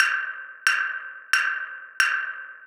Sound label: JUNKYARD RHYTHMS